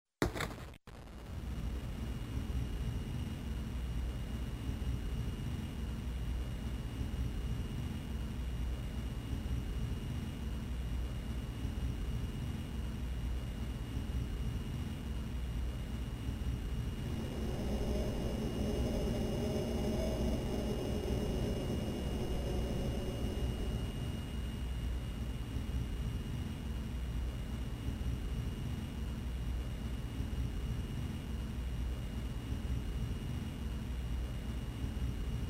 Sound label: Wind